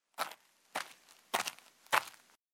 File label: Footsteps; Age of Empires III; cinematics